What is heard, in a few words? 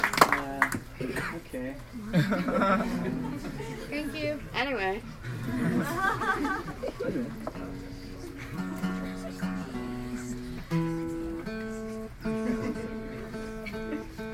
Tuning